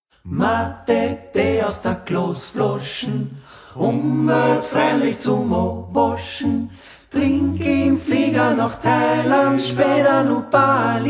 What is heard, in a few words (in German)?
gesangskapelle